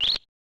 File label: Bird Call